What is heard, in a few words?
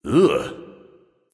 "ugh"; smite; voicepack; ullr